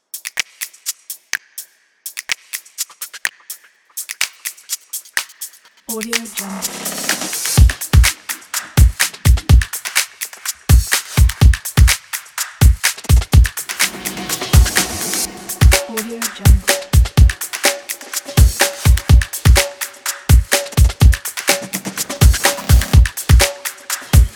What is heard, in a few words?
claps beats